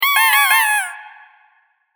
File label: correct; チキンのおもちゃでピンポン。その５; 正解の音・ピンポーン